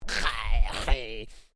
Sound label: Created creature small yech; Created creatures, vocalisations and pretend speech; Aliens; SoundEffects Foundation2